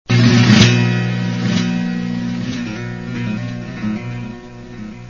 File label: strum